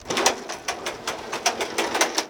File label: Plane P40 Canopy Crank Open; Airplanes